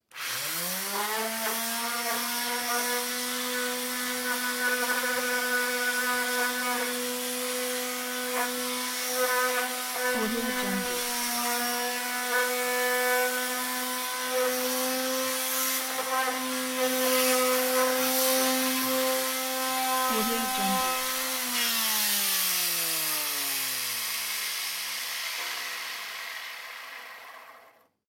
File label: industrial tool sheet sander on sand wood off; blazznet